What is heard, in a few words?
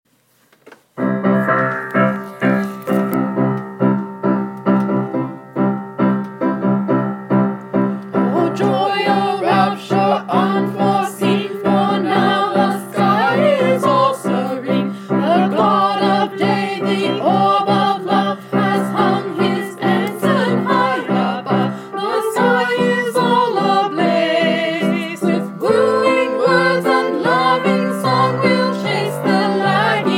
ensemble